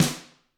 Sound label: GRETSCH SNR, Double Platinum Drums; ILIO; Double Platinum Drums 1